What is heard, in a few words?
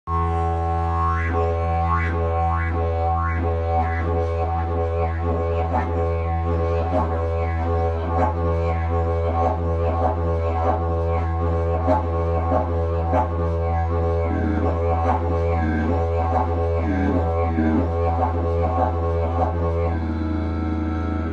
didgeridoo; jilm prirodni